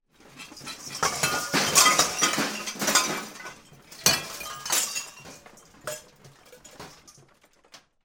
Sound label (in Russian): posuda; Звук землетрясения в доме; Звук землетрясения в доме, когда трясется мебель, падает посуда с полок, звуки скрипа, гул